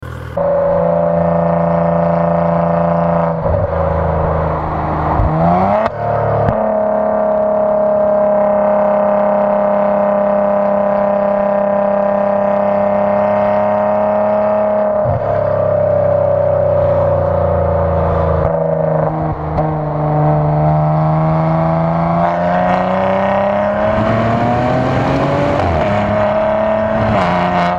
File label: Maserati Ghibli